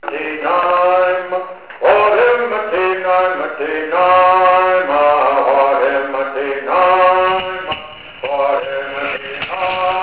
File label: Bird